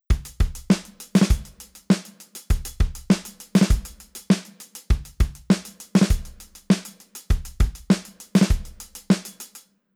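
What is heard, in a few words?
drum varying velocity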